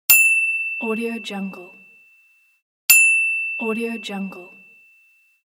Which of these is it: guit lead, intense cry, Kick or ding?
ding